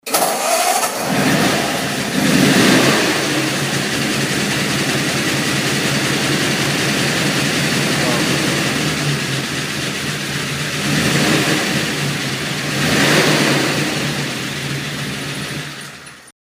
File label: 1936 Ford